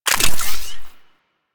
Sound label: Modular Secondary Handle Fast Reload, Guns; SU Modular Guns; Reloads